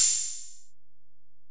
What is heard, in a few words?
HiHat, Alesis DM-PRO